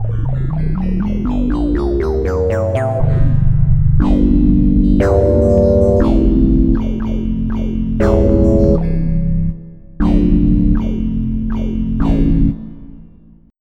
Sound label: SilkWerm; Onj's Soundfont